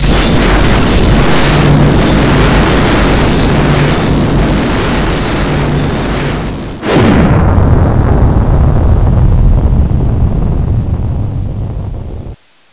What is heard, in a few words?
boss destroy